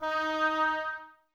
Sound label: OBOES D#, Woodwind; Wood Winds; Oboes Links; Miroslav Vitous; Woodwind Brass Ensemble